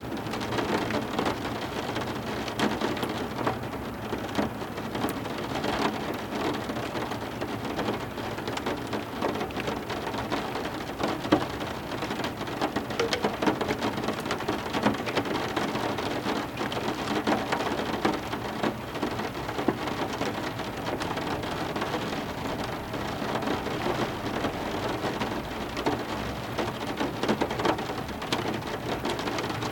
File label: Rain, Interior, Window, fat raindrops; toneglowlibraries